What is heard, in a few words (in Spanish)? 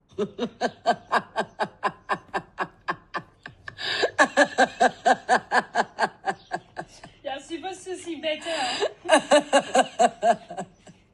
risa